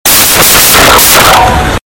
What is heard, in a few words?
android loud notification